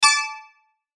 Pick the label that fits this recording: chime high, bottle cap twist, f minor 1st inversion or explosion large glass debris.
chime high